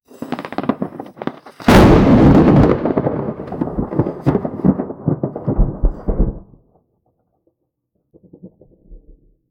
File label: vivid comic book style, lightning